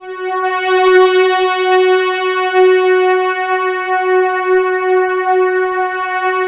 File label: DENSE FL, PADS; Masterbits; Vintage Voltage